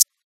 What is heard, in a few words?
HAT